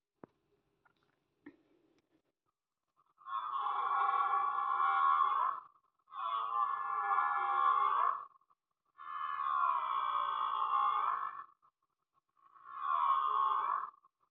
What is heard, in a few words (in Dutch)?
hert, animal sounds